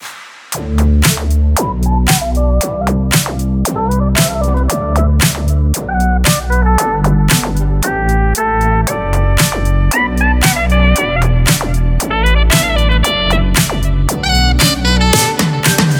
sax mix

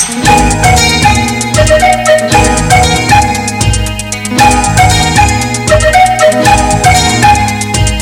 beautiful msg

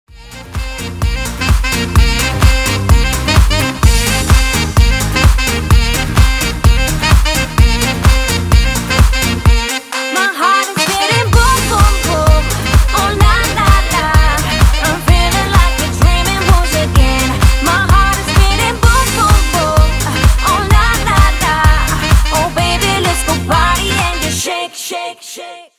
sax

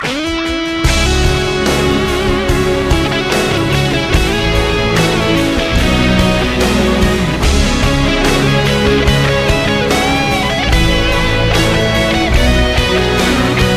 best guitar solo

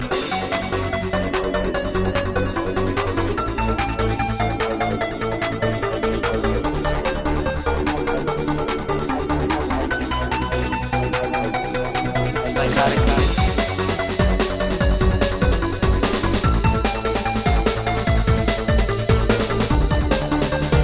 psychosis beats

